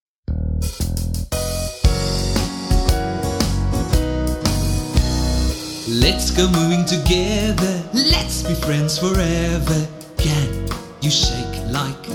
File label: sung gesing